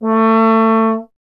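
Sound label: bass trombone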